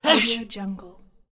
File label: sneeze